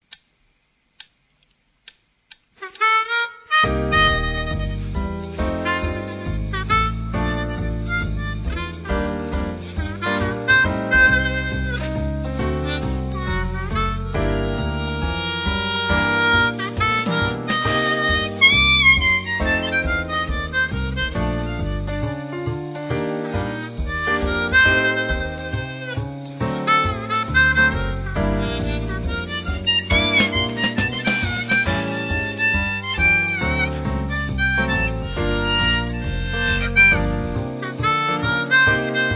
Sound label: harmonica